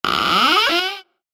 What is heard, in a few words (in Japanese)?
扉を開ける リバーブ無し; ＲＰＧゲームのダンジョンやフィールド; ダンジョン・フィールドの効果音